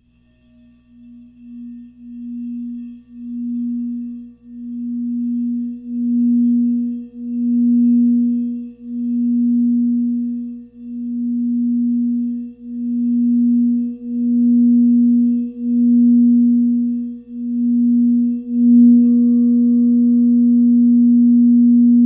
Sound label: Crystal Tones Singing Bowls